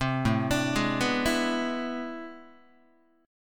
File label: A-Minor Major 11th Guitar, AmM11 chord; arpeggiated; JGuitar